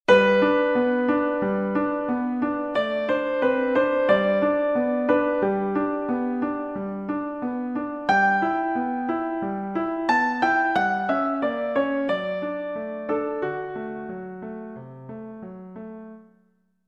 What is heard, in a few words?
lucidity piano